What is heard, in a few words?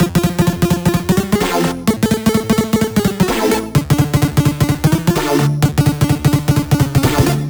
128BPM Melody; Vengeance Samples; VFH1 128BPM Australian Kit